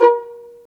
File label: strings pizz, violas pizz; Roland LCDP13 String Sections; STR Vas Pizz